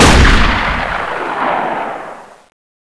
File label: start shot; de smallcity